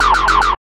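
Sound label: Alert Space; FREE SFX PACK VOL 1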